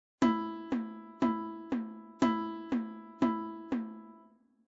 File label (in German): Notenwerte Halbe